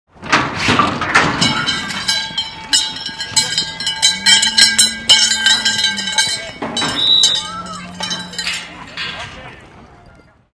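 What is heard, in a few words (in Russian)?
родео открывают клетку звон колокольчика на шее быка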